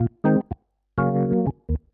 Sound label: ORGN 123, Keys; Houseworx